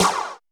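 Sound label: Snare Flange Shot, Alesis D4; 300 Drum Machines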